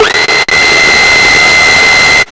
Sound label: NUCLEAR, Hard & Loud Techno; USB Soundscan vol.01; AKAI